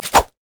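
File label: siegerider basic attack; minions; LoL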